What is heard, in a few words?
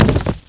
bodyfall